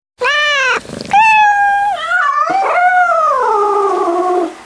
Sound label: animal sounds cats